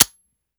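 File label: weapon fire first player; gmod; viper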